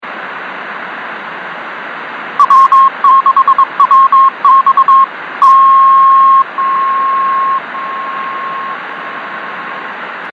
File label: beacon 24930 kHz; W6WX